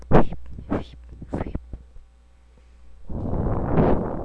whip

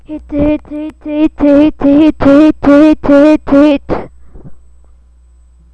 hit

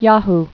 yahoo

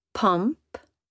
pump

